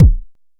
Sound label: analog quick, kick; Ableton Foundation FreePacks